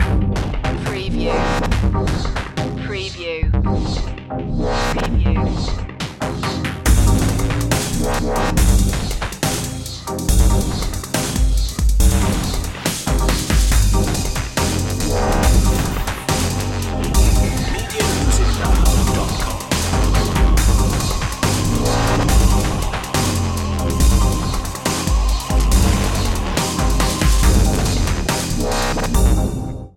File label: ice ragga